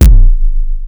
Roland Sh-101; Drums

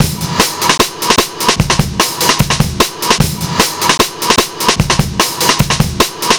150, Drum Loops; Total Drum&Bass

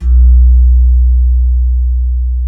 KEY GONG, Bronze Gamelan; E-MU Formula 4000 Series Vol. 4; Earth Tones

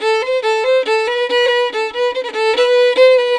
140 AM violin, Celtic Flavours; USB Soundscan vol.42; AKAI

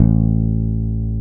BAS; Best Service Gigapack 2

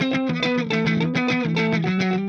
Clean Funk Guitar

